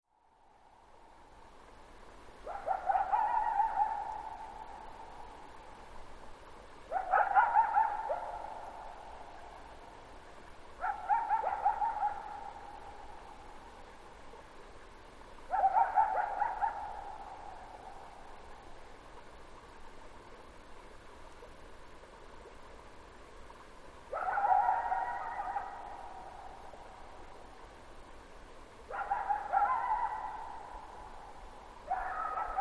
night coyote; A coyote called across the moonlit meadow. Grant County Oregon.; Microphone: Rode NT1-A(x2) Recorder: Marantz PMD670

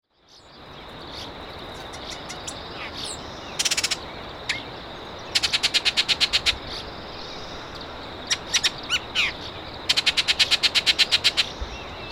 Rogue R Bird; Bullock's Oriole in the brush along the Rogue River, Josephine County Oregon.; Microphone: Rode NT1-A(x2)

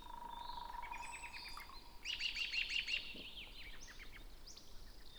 robin

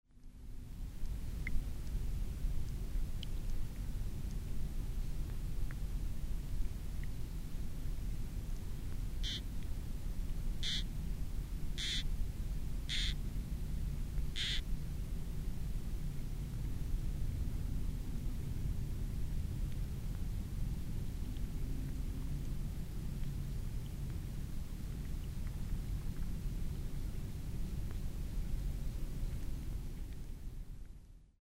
hydrophone frog

